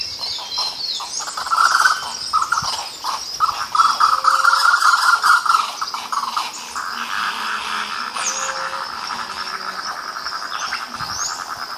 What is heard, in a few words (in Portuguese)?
canto do anu coroca